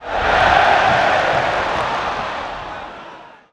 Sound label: Light Cheer, Crowd; David Beckham Soccer; XBOX